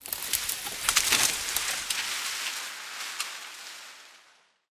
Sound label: plant wither